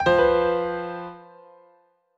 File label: Jingle Give Up; clubhouse games 51 worldwide classics 2020 switch gamerip